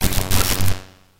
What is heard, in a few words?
Static Burst; soundhacks